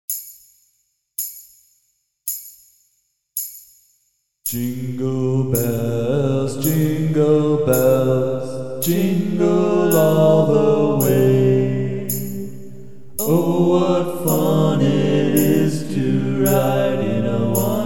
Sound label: jingle bells